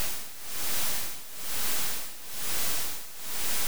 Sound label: Rythmic Noise 130BPM